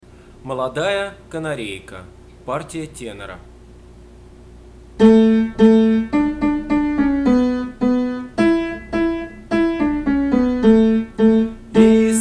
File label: tenor; molodaya kanareika